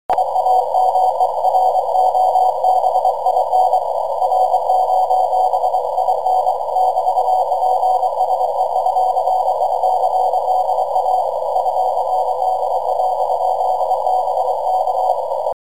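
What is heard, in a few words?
CW unprocessed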